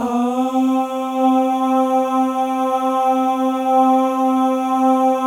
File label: MALE AHS, Symphony of Voices; Spectrasonics